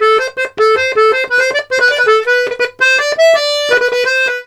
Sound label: LARIDE, Accordions; USB Soundscan vol.40; AKAI